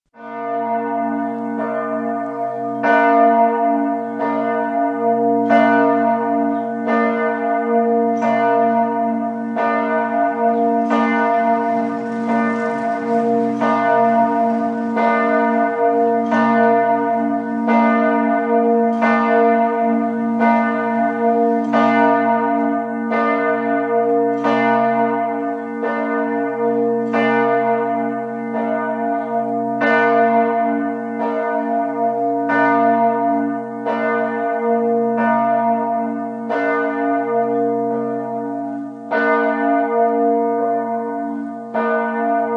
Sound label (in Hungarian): HARANGSZÓ DÉLRE; szignalok; GYULAFEHERVAR